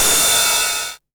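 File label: 909 ride tape saturation; Goldbaby Tape808 & Tape909; The Tape 909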